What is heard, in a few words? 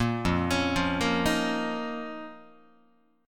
F 7th Suspended 4th Sharp 5th F down Guitar